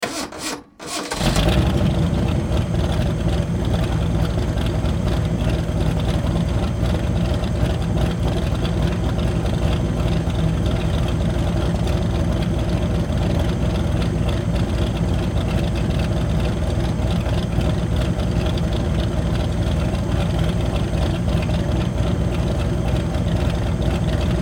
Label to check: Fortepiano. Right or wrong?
Wrong